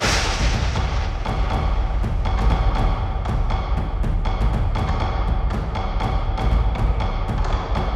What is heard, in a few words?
Bonus Loop Intro; Wu Xing